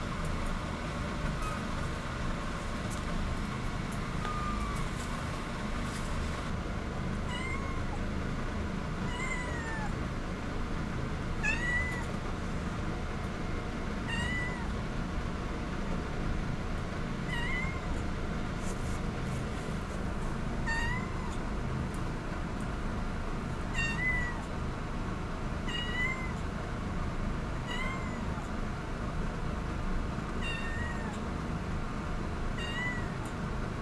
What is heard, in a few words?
neko voice